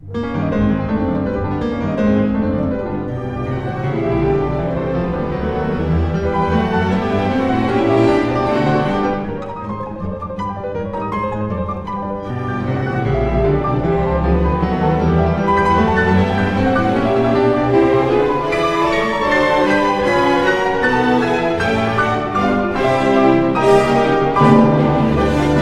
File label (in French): concerto pour piano, allegro con fuoco; TCHAIKOVSKI